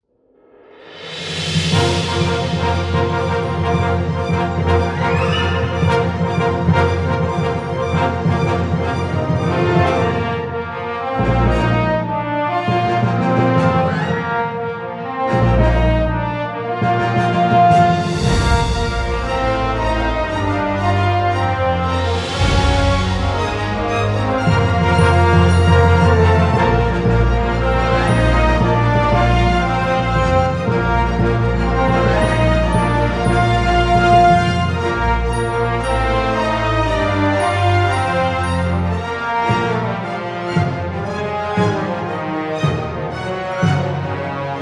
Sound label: Toy Spaceship